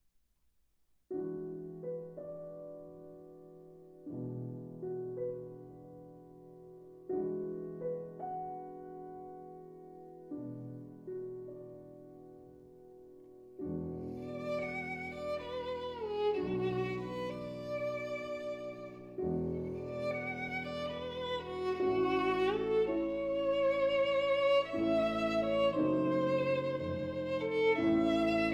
Sonata For Violin and Piano in A Major, Allegretto (Ben) Moderato